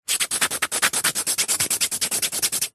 foley wood thin strips rub together fast trying to light fire; zapsplat